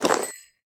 armor light; smite; chiron

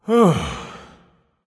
sigh; chaac

